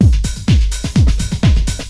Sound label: loop; SoundFactory; SMPMANIA